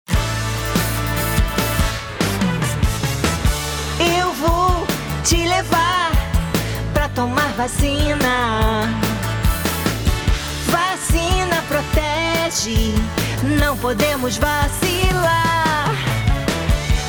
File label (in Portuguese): jingle